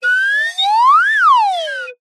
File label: cartoon slide whistle up and down